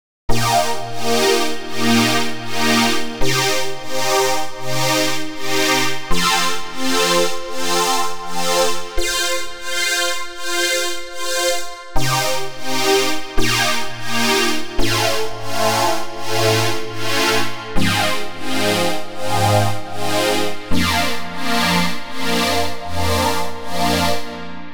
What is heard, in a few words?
amber lead; the space soundset